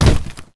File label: footsteps land cardboard; player